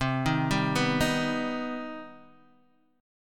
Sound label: C Minor Major 7th C Guitar Open D